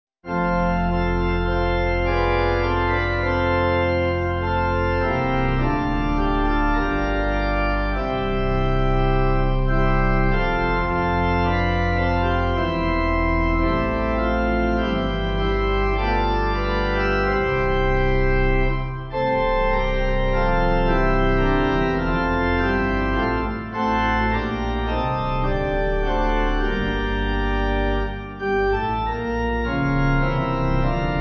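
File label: Pipe, Pipe Organ; O Jesus I Have Promised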